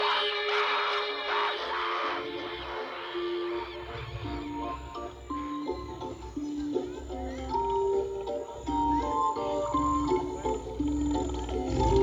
Waltz

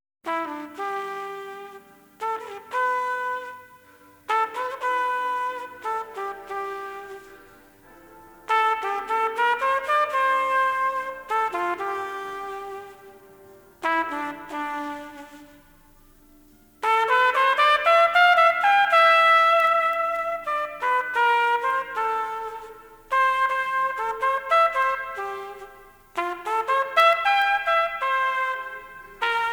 Solo

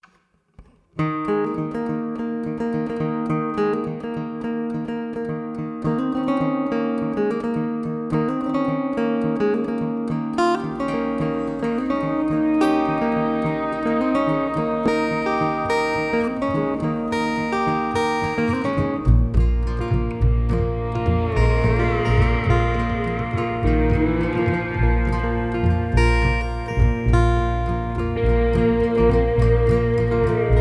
Mojo Magazine

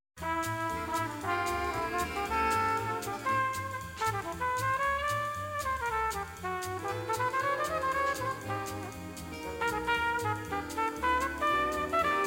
Solo

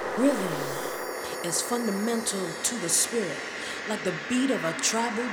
Vocal; SomaRecords20

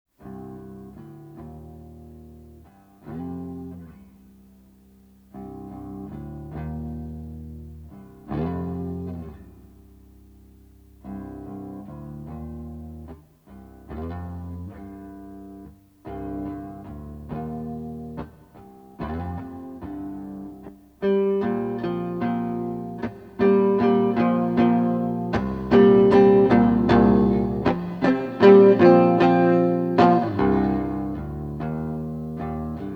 Instrumental

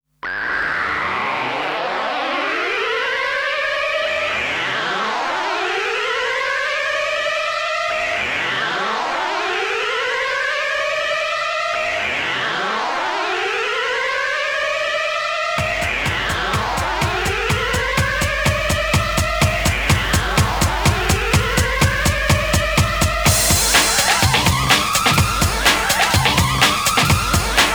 Siren Beats

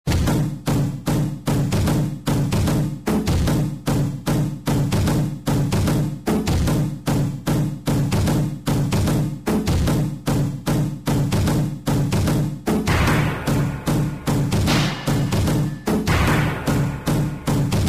Jungle

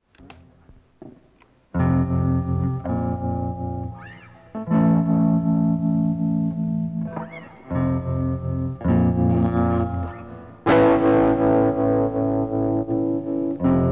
Instrumental

